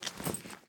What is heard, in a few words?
minion armor; smite; npc; roman archer